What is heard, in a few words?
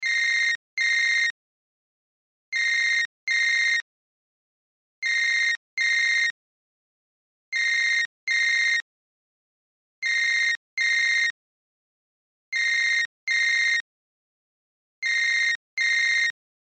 Cell Ringtone; Loop; Cell Phone; Cell Phones (Sound Effects:Electronics)